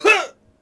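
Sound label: Swordsman Hit Vox; Civ4 warlords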